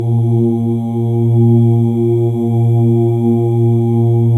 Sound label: MALE OOS, Symphony of Voices; Spectrasonics